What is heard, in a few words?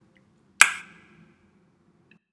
Tongue Pop